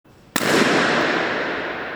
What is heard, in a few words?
balloon